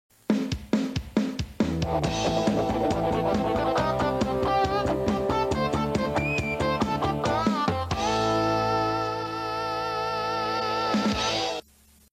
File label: rock bumper; weinerville; clean